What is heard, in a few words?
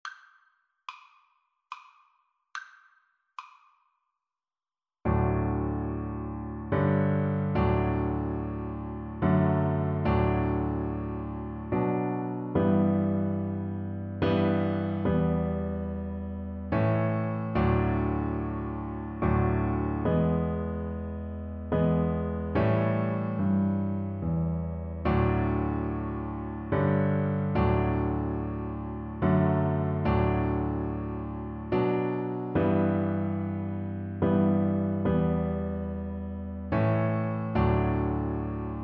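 viola karaoke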